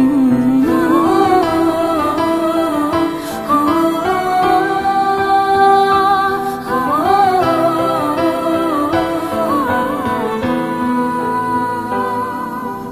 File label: Female Cover Version Instrumental